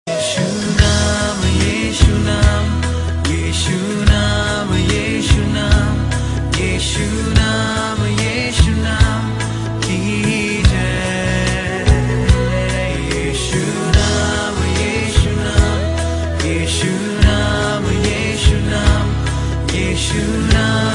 midi sequence

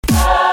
oohhh aahhh; ringtones; sms

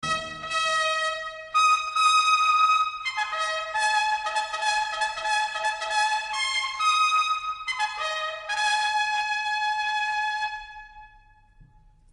POST HORN GALOP

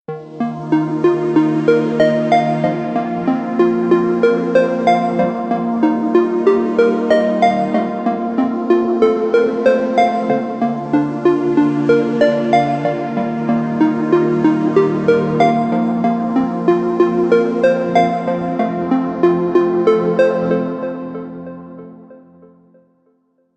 soft music; ringtones

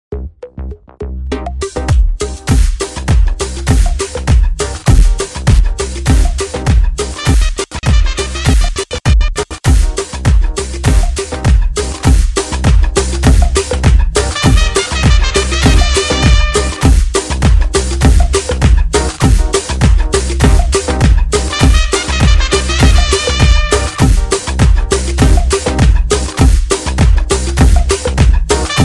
tone, ringtones; ipl 5 2012

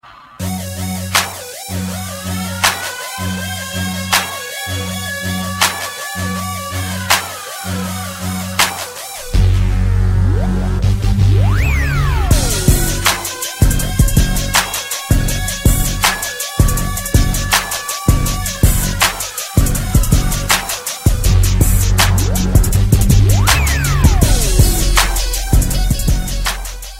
instrumental

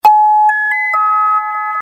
ALERT TONE

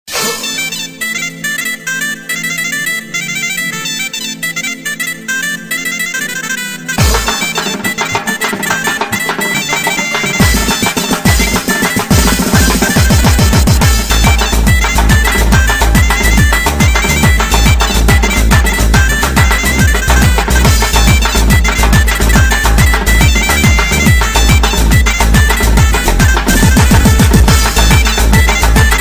techno lezginka

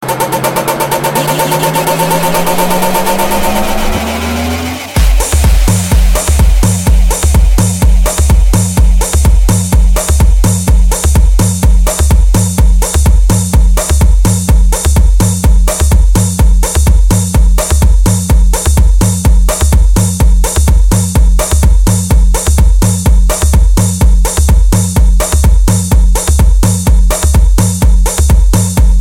Minimal Ringtone

